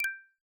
channel recv; voice-cat

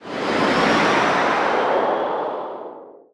teleport start; chat grid

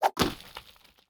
drop item; stardew-access

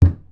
wall; chat grid

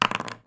roll; chat grid

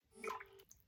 upload fail; notebrook-notes

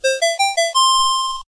announcement; clock; chat grid

